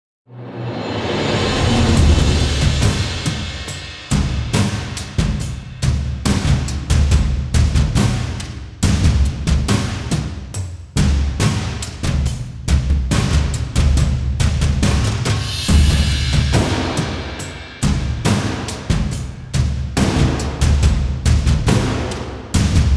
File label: Combat; Kaermorhen